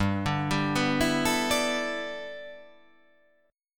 G 6th Add 9th

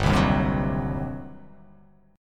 G Major 7th Suspended 4th Sharp 5th, G, down

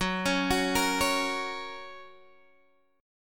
F sharp Major, Guitar Standard; arpeggiated; x,9,11,11,11,9; JGuitar

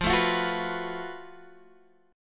F sharp Minor Major 11th, guitar

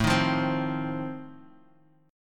F 7th A

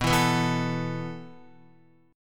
B 7th Suspended 4th B down Guitar; B7sus4; strummed; JGuitar

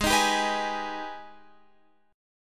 Ab Minor Major 7th, down, Guitar, Open F; strummed; AbmM7

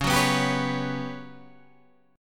G Major 7th Flat 5th C sharp

